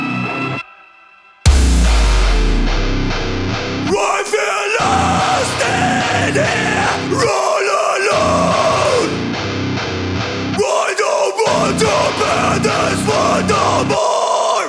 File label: hardcore